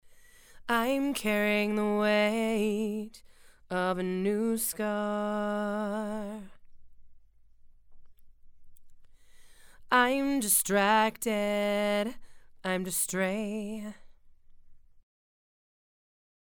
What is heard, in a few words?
Female Vocals